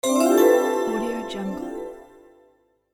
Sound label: magic spell